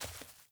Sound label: Sandals Walk Dirt; Characters; Player